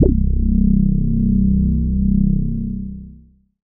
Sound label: bass c, Sub Bass; Dance Synths; Samples vol.37 - Dance Synths